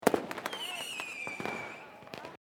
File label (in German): Silvester Pfeifer